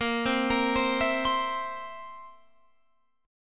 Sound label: A sharp Suspended 2nd Flat 5th, Guitar Open F; arpeggiated; JGuitar; 22,19,22,19,19,19